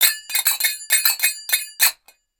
Egyptian Dance

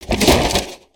Crate Smash; destructibles

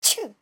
Pirate Sneeze Choo

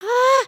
need to pee again; emotes

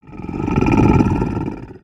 Idle Growl, creatures organic

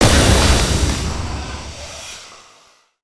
Serpent Smashable; destructibles

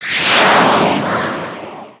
Jet pack fly; emotes

